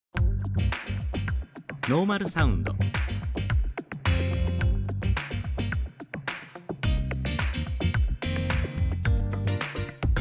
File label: m fusion normal